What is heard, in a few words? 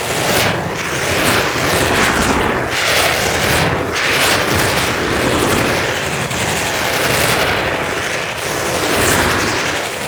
Gas City Tube Spiral Fire Loop; Ambience; Props; Warframe; Corpus Gas City; Props Remaster